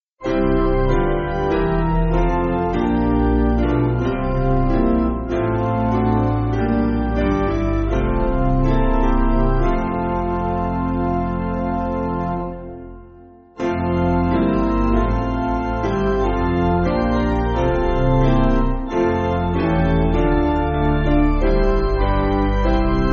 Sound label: Piano